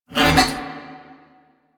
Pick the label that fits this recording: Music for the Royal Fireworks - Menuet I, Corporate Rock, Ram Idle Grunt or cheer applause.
Ram Idle Grunt